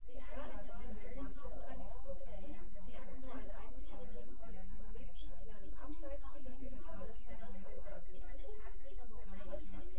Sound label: female synth conv sim; SASSEC07